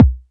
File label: BD